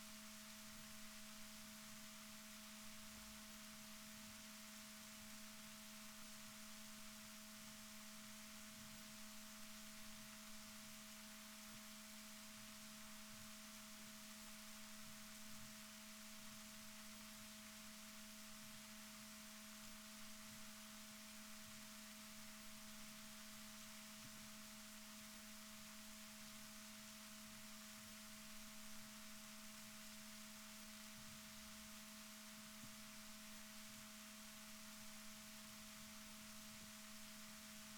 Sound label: rorquals positif, Balaenoptera Physalus Chile